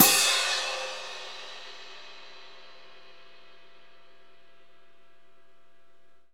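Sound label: ORCH, CONCERT CYMBAL; Northstar - New Gold VOL-2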